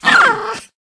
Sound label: two headed hound damage, monster